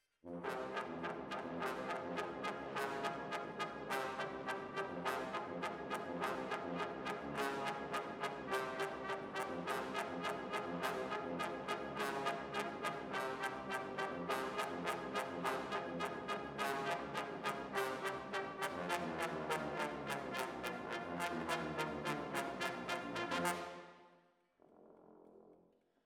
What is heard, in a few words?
ORTF, Jazz for piano